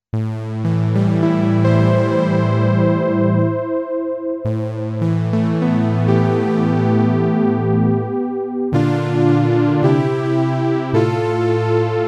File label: blue syn